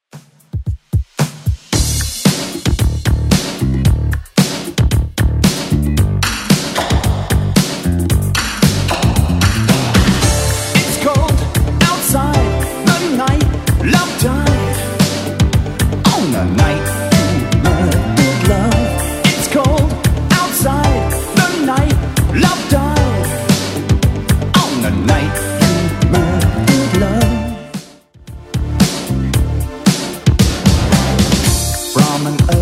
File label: 80s Redrum 113bpm